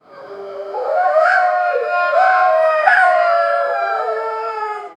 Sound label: the sound of a group, tiger